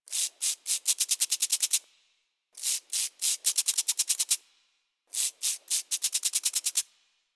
Forest Insect Rattle Short; Warframe; Ambience; Grineer Forest

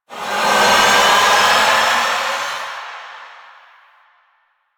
Light Activate; Warframe; Eximus; JadeLight

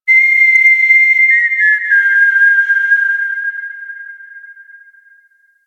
Puzzle Beacon; Warframe; Duviri; Bombastine Encounter

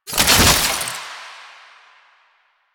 Soldier Zipline Stop; Enemies; Soldiers; Warframe; Lasrian

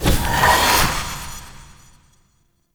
Stomp Cast; Powers; Fey; Founders Champion

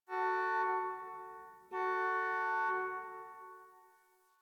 Parking Lot Distant Car Horn; Warframe; Ambience; Nineteen Ninety Nine

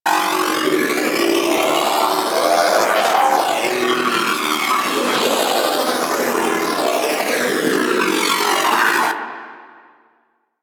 Companion Laser Beam Loop; Warframe; Pets; Zanuka; Abilities

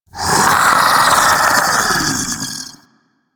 Infested Splitter Joined Vocal Idle; Warframe; Grey Strain

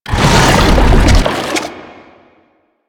Whoosh; Warframe; Man In The Wall; Octopede

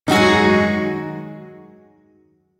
Shawzin Yo Chord; Warframe; Duviri Shawzin

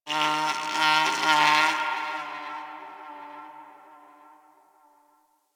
Distant Creature; Ambience; One Offs; Warframe; Eidolon Plains

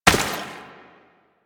Step Water, Footsteps

